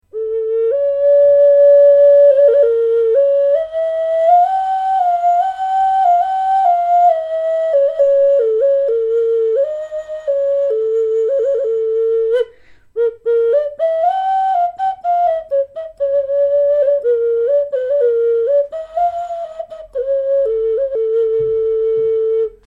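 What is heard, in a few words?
ocarina mask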